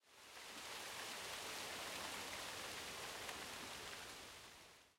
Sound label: leaves